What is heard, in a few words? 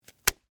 Rope Cut Cotton; Hand Tools